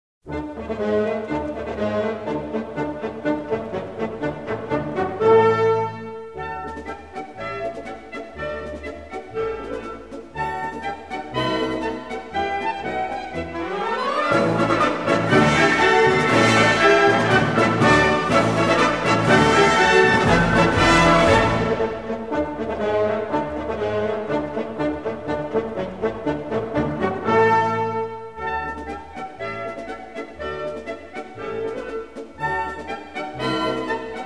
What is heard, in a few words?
Military March